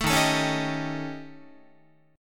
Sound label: Eb 7th Flat 5th Eb down Guitar; 12,11,12,11,11,14; Eb7b5 strummed; JGuitar